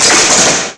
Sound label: zap